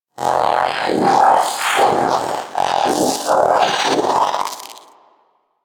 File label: Vocal Idle Growl; Warframe; Pets; Zanuka; Zanuka Companion A